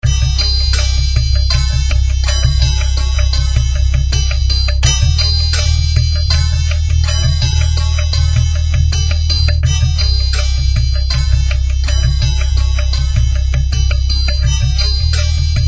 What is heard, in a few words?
bells ringtone